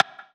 stick

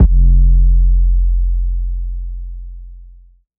808, F; 808s

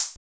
Percs

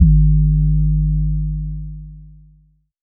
808 G#; Cymatics

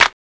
clap

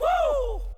"Woo"

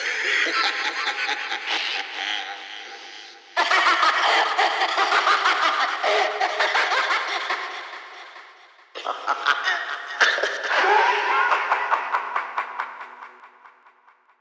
LAUGH

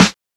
Snares

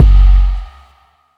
Unkown Kick

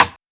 sizzle (rim); Percs

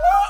Hypeman Chant

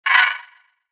Fx3 Hard Soft; Reason Factory Sound Bank

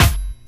snare

